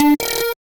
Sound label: alert, notifications; Android